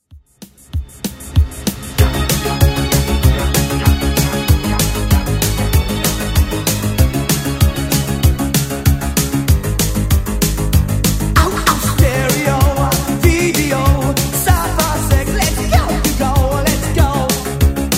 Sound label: 80s Redrum 192bpm